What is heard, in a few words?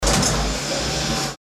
short industrial event